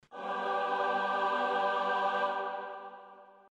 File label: game opening ahhh